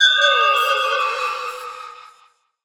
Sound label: allay idle without item; mob; minecraft